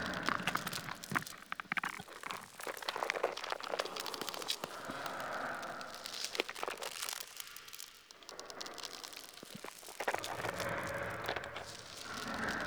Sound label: Exterior Covered Tendon Area Loop, Ambience Infested; Warframe; Infested Eris; Amb Loops Exterior